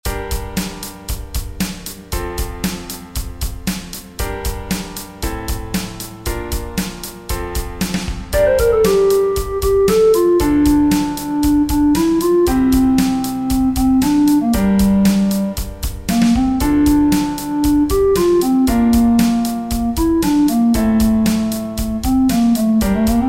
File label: flute and guitar; cryingbear